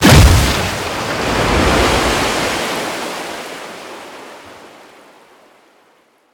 damage vehicle; World Of Warships

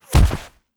bullet impact snow; Rust

